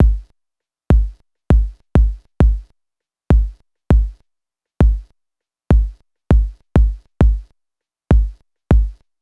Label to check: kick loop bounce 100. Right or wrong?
Right